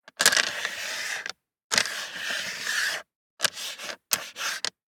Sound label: DICE on Plastic, Slide, Standard, 5 Five Dice; Samples; ArticulatedSounds; DICE Sfx Party (Extended)